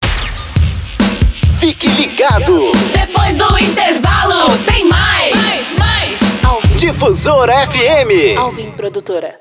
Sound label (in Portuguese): ENTRADA BREAK; PACOTE ENTRADA E SAIDA BREAK